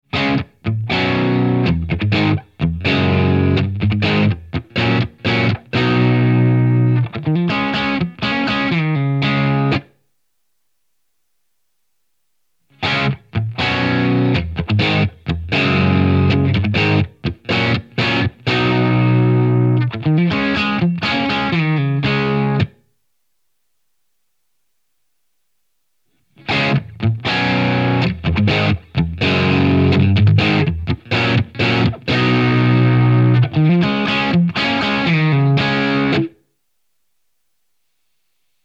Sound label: Variable Crunchitude; Strymon Deco → Strymon Iridium; Tape